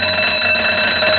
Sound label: ALARM, ambience; dod